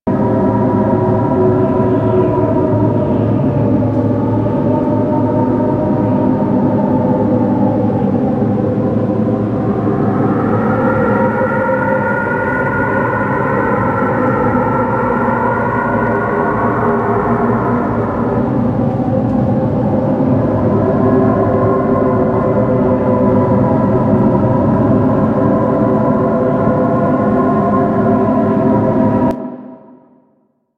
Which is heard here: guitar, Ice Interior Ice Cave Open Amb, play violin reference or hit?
Ice Interior Ice Cave Open Amb